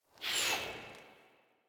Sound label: Weapon Liberty Launcher Tail; Warframe; Caliber Chicks Minigame; Weapons